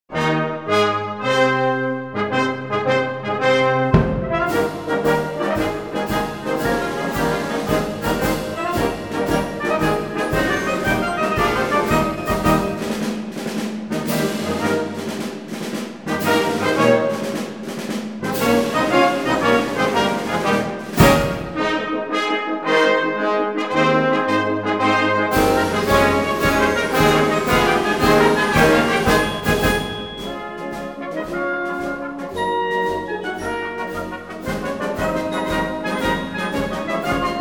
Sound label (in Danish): Lejr Reveille